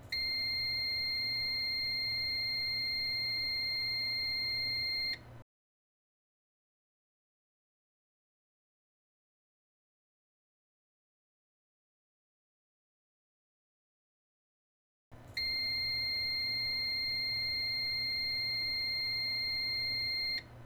cyberpower beeping 4 continuous beep every 15 seconds